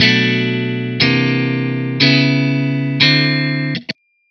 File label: dry amp guitar chords; prism distortion